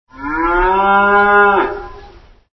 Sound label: Muuu